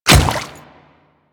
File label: Water Step, Footsteps; Royal Guard